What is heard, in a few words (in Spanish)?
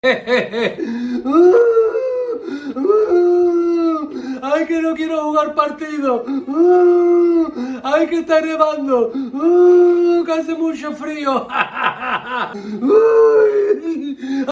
lloros